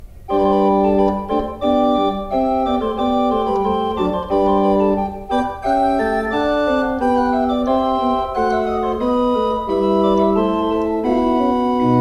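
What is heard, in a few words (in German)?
Grenzing 7 II P, pfeifenorgeln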